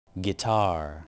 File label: guitar